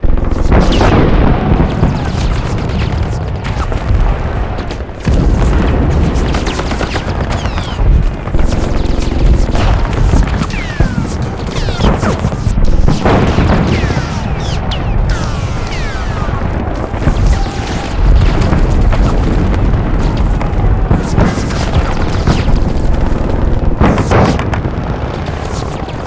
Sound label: trench, ambience; Quake 4 Alpha; id software